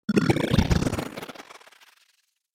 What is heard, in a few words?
Bit Bubbles